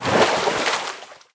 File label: Footsteps